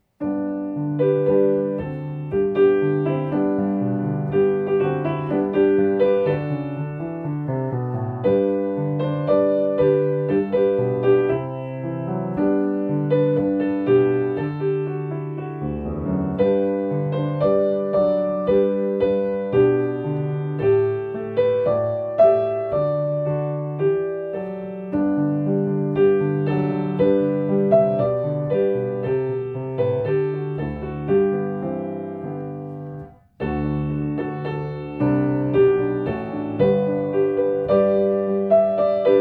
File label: piano; gakuenka